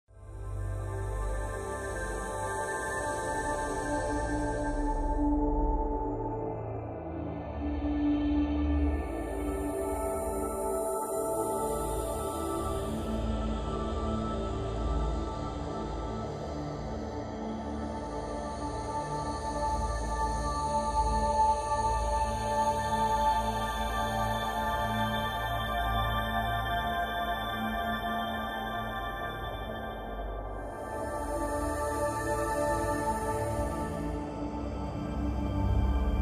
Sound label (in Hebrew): מוזיקת ניו אייג